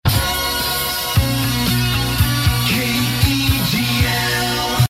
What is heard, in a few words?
minor jingle; KEGL